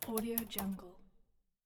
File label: typewriter key multiple